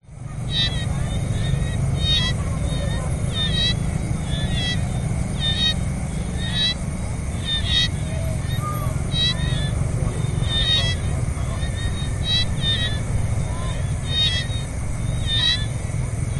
Cambodia Siem Reap Night By Pond Frogs Cars People in Background